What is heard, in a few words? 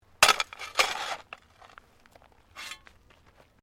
Digging Shovel Mulch